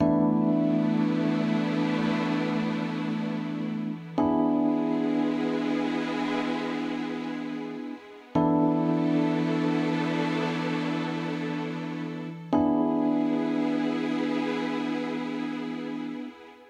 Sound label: fs Outro Loop; twin spin mw